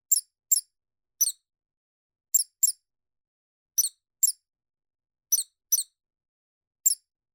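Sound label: mice voice; myshi golos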